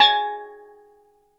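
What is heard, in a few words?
steel drum G#3, tonal percs; Crypton - DrumBase5000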